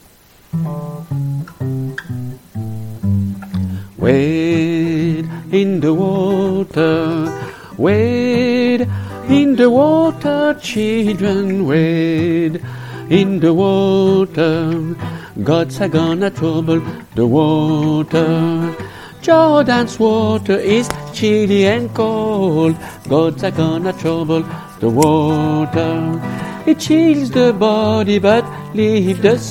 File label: soprano, chorale